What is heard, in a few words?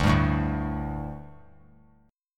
D Suspended 4th D; strummed